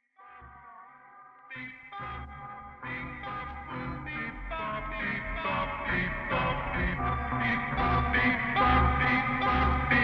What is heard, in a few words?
beep-bop